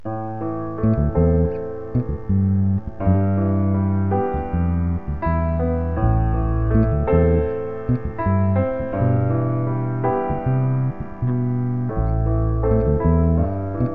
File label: A minor Ambient Piano